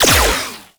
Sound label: Beam Shot Fire; Warframe; Corpus; Glaxion Beam Moa